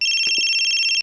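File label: keitai, mobile phone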